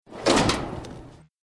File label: Chest Click; slots; Realm Saga